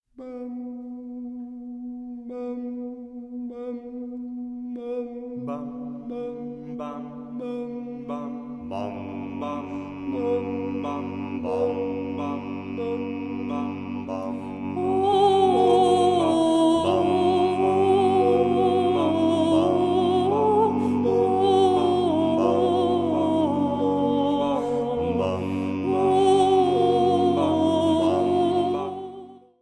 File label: acappella; Abendlauten